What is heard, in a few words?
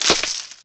Hit Brush; Test Drive Off-Road 3